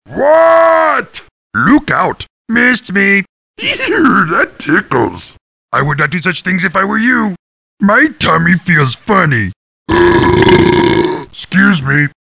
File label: orc; warcraft 2